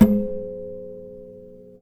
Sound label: Classical Guitar Extended Technique Plink